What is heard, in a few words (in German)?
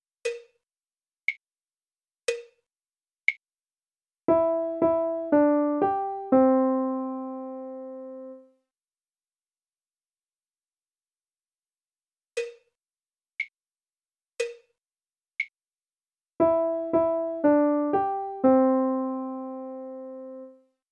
Melodie